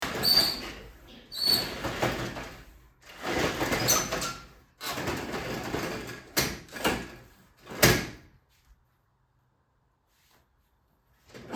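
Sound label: opening closing window roller shutter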